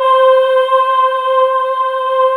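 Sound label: VOICE SETS C5, Choir Acoustic & Synth; USB Soundscan vol.28 - Choir Acoustic & Synth; AKAI; VOIX SETS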